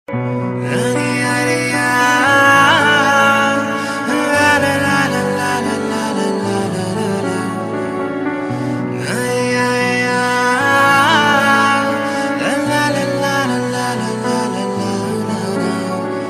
New Saddest Tone

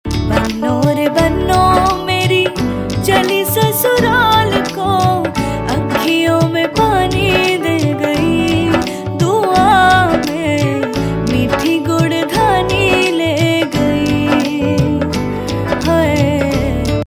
Female, Ringtone, Bollywood; Yeh Jawaani Hai Deewani

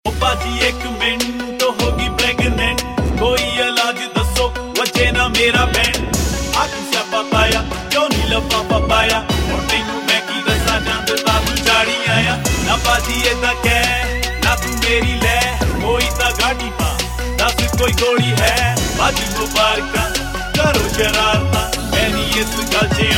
Rap Ringtone, Punjabi

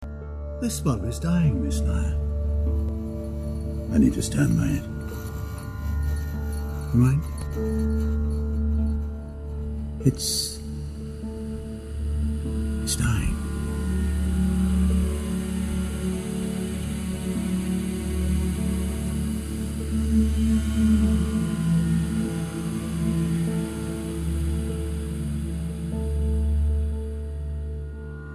Instrumental; Black (2005); This Bulb Is Dying

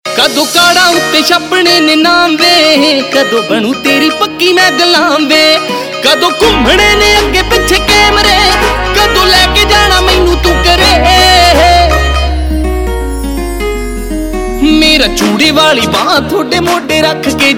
Ringtone, Punjabi

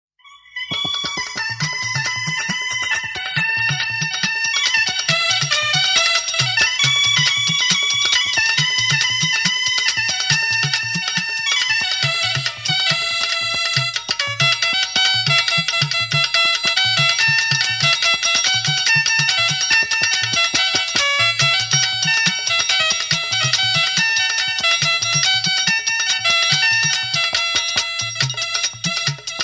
Male

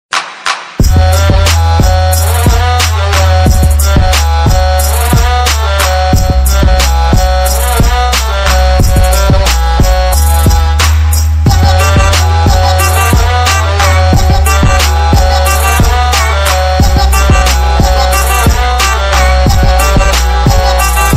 Ringtone

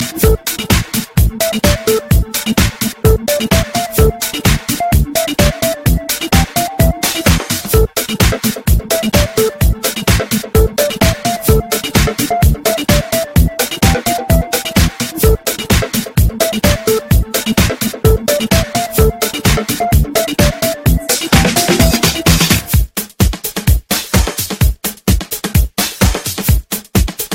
Ultra Mix Alert

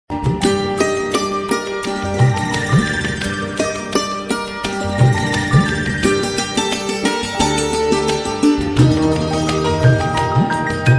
Santoor Mix Instrumental

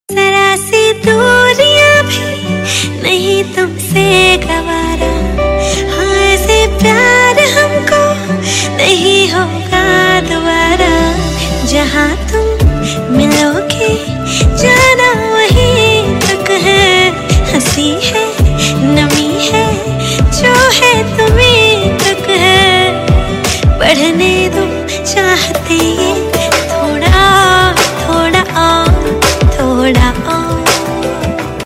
Female Version Ringtone, Bollywood; Ranchi Diaries

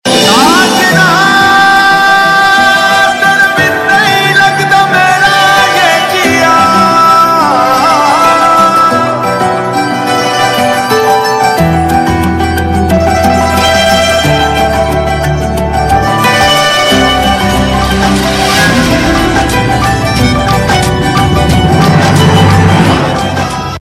Tune Male Version; TV Serial Tone; Colors TV; Shastri Sisters

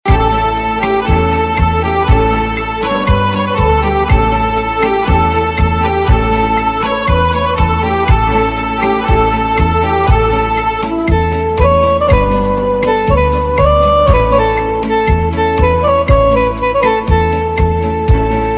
Instrumental Ringtone; Bollywood Hits (2014)

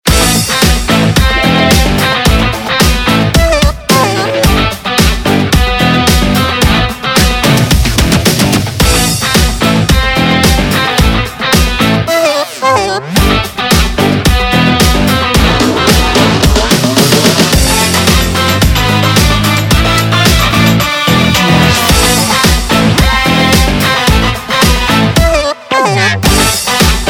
Instrumental; Bollywood; Befikre

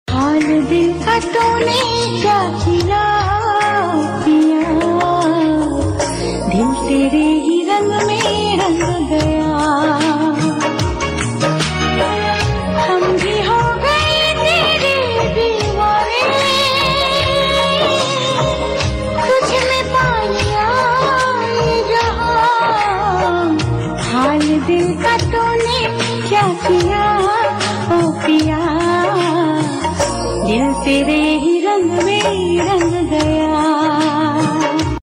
Female Version, Song Bollywood Punjabi Pop

